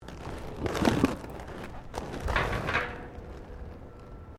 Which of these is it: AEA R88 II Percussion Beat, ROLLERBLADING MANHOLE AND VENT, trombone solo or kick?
ROLLERBLADING MANHOLE AND VENT